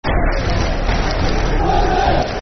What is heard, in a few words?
(Clap) Wednesday, Football Chants; Sheffield Wednesday Swfc